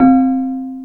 METAL LO; PERCUSSION; Back In Time Records - Big Bang

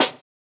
Snare, jungle breaks drum sounds

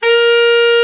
BRA TENOR, SAX; Best Service Gigapack 1; BR SAX 2

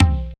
EQ Perc, Percussion Mix; Equipped Music; Loungin House

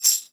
RHYTHM, PERKUSSION; Alpine Volksmusik

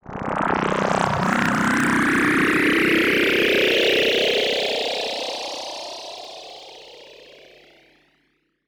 Virus LFO Sweep

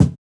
Kick, jungle breaks drum sounds

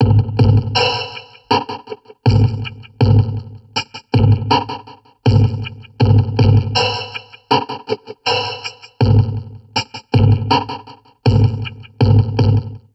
Drum Loops Crazy Processed

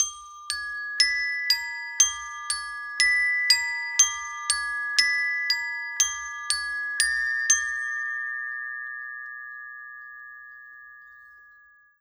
Bells, Orchestral, Rock Pop; Sonic Foundry (Sony Creative Software); Orchestral Series VOL-4 - Rock and Pop

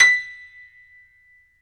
PNO, Grand Pno; Club-50 - Foundations Roland; YPno 1 M

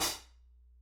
Soft Xtra Dark, Hats; Airwindows

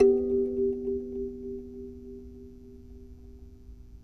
Thumb Piano, World Percussion One Shots; Sony CS

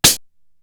CYBER KIT, DRUMS

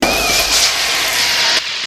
Clockwise, Drum&Bass Hits & Bits; Total Drum&Bass; 90 s Sample CDs

